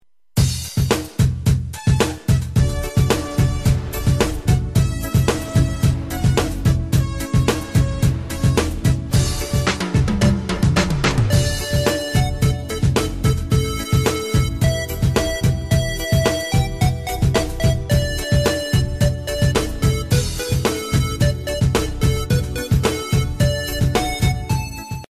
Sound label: beat